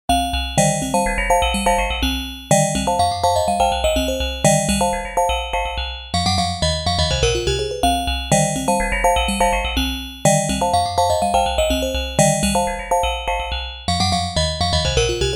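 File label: chime, Chromatic hits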